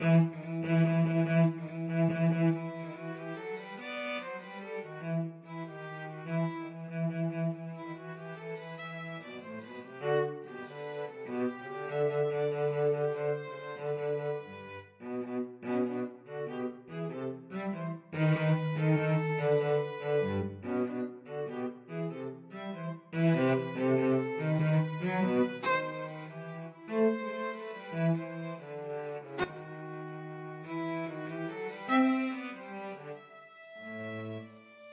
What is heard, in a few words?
violin cello duet